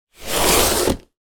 industrial sanding wooden door sand paper single long scrape; zapsplat